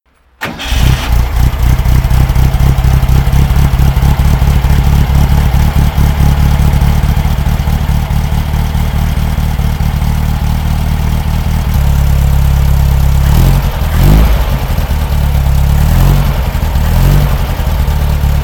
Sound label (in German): buggy auspuff; typ sc